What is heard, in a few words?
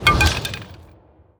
Wand Dark Death Underlay